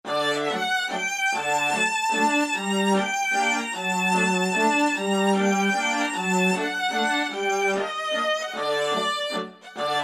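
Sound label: strings Big Viola; E-mu Proteus FX; Factory Presets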